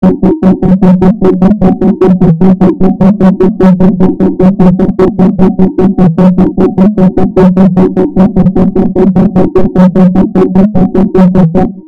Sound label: abstract rythm piece